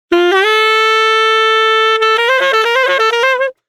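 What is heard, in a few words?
Bass Mute; саксофон; Sax Solo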